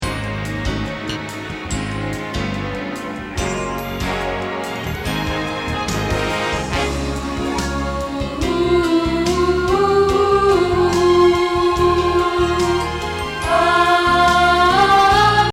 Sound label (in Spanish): Contralto